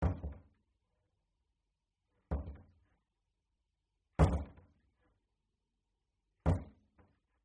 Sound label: DOOR Wooden Living Room Not Fully Closing Open and Close Soft Distant